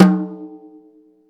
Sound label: TOM, Drum Dry; East Collexion; Drum 1